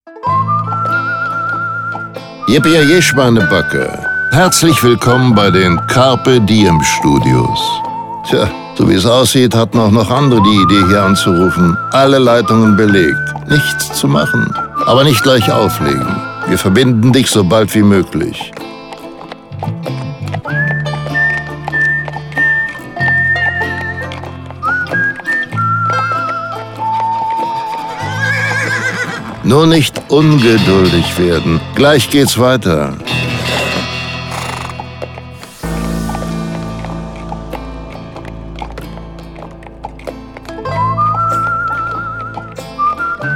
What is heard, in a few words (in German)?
Warteschleife PASTA Musik; Bruce Willis